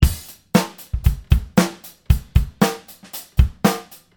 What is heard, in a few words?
Basic drum